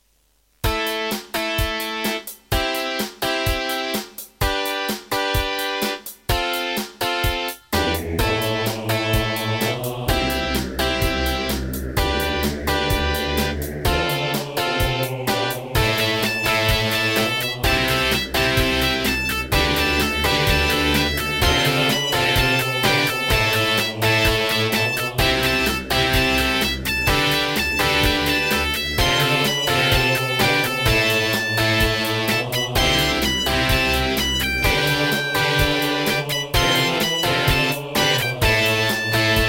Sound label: Serdaco Dreamblaster X2GS BURAN V1.1; raptor call of the shadows